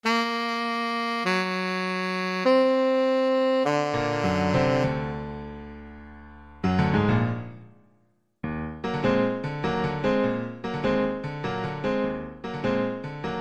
tenor saxophone

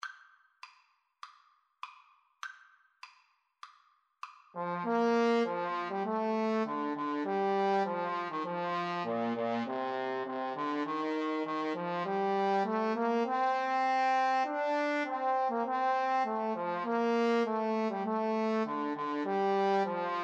Trombone Duet

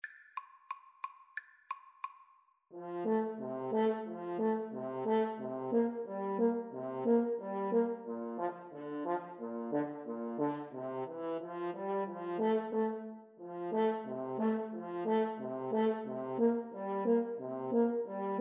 sailors hornpipe 2 horn

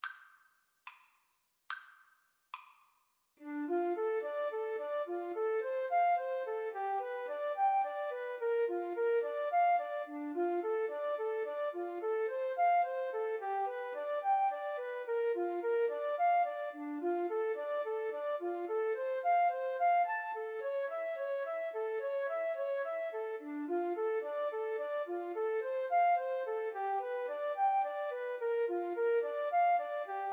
house of the rising sun, Flute Duet

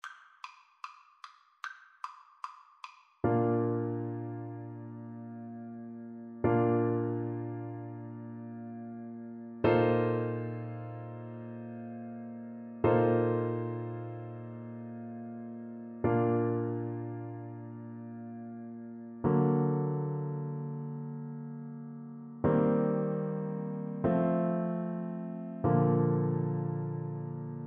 largo, Bassoon

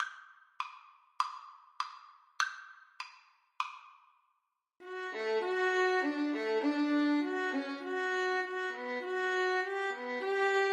Violin Duet

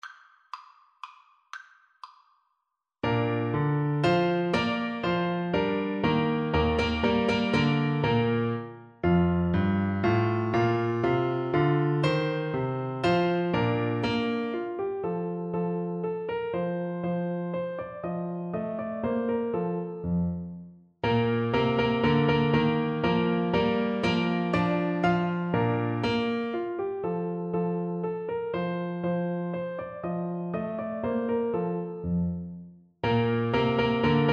German Dance Clarinet; K605

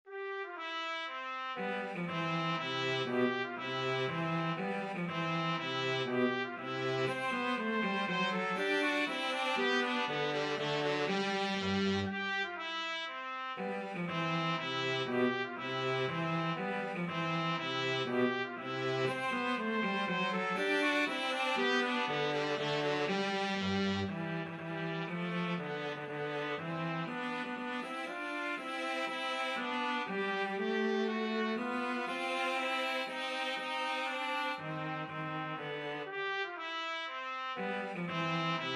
minuet, trumpet cello; trumpet-cello duet

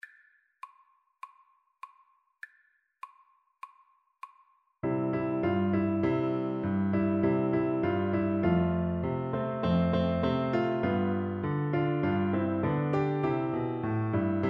jingle bells, Piano Duet

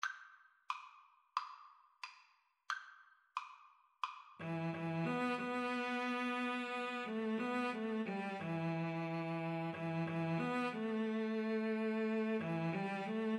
Cello Duet

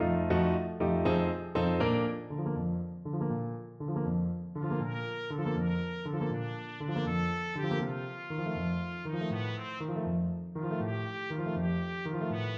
wandering, trumpet

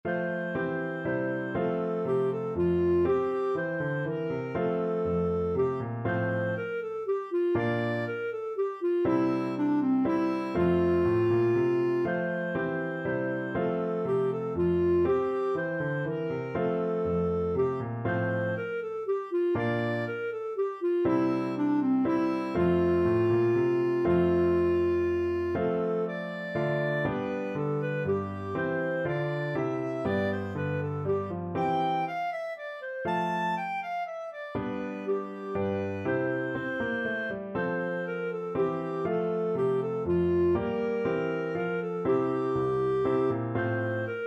clarinet; bach minuet3 bwv822

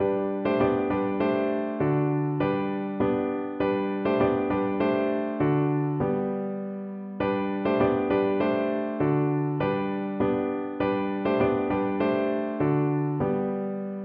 piano

